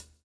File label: swing